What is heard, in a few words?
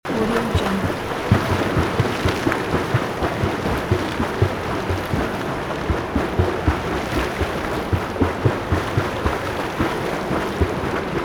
swimming splashing at indoor pool